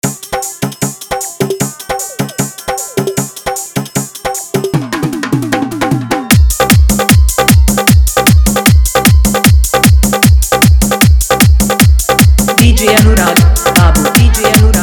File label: Hard Dhollki Bass Remix